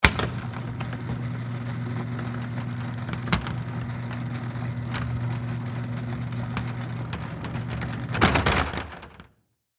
Industry, Cargo, Bay, Door, Close, Chain, Pulley, Workshop; Garage Door